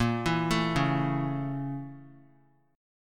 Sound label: A sharp Minor Major 7th Flat 5th A sharp; arpeggiated; 3C,3F,3A,3D; 22,23,24,23; JGuitar